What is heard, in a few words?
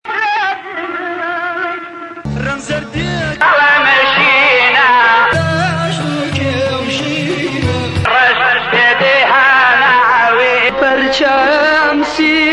kurdish color in songs